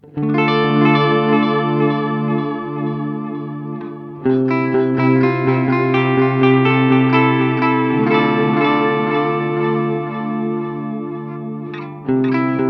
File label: Chase Bliss Blooper